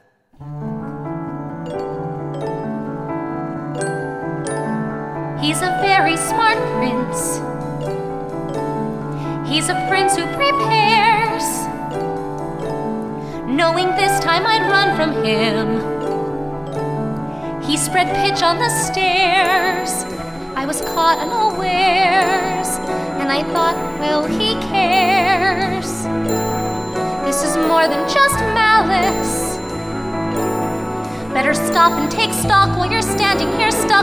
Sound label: female lead practice